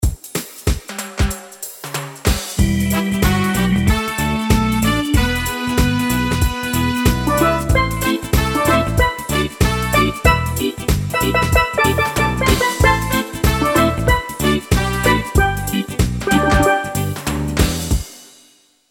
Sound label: Caribbean, notifications; Vertex; Impress Drive